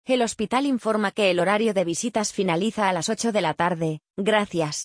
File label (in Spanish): hospital